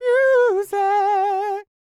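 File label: falsetto, R&B Dance; Spectrasonics Vocal Planet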